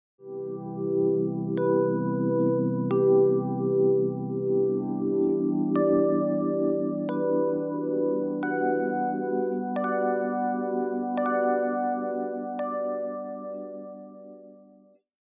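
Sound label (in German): weihnachtlicher 3Klangs Startsound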